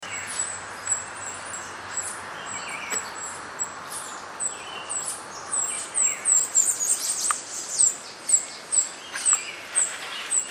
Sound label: Minute hermit, پرنده; گوشهگیر ریزه